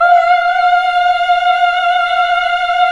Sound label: Nuns Hmmhh; Sound & Vision - Gigapack II